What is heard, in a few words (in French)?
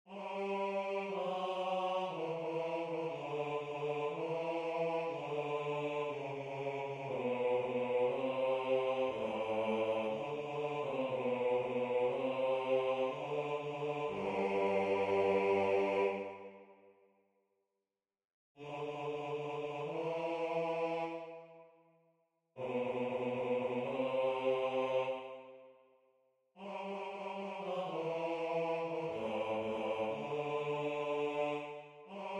A Toi puissance et gloire Basse